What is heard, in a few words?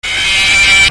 whoop